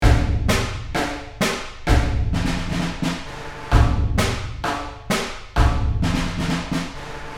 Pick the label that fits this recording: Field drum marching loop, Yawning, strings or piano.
Field drum marching loop